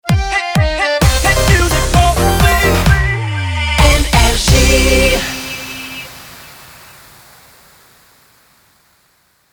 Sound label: Jingle Shotgun; NRJ